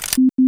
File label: microwave switch